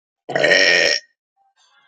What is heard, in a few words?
sissy burp